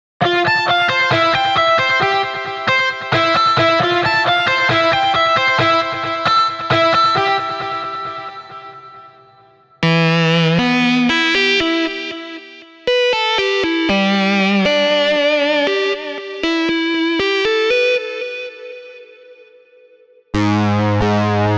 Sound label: Melodies Rock Metal